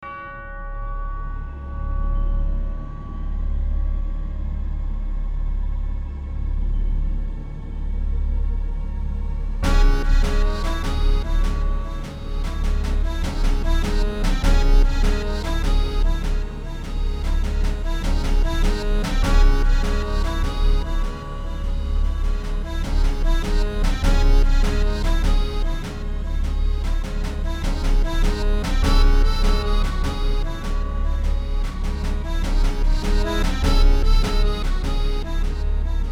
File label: Little Marching Band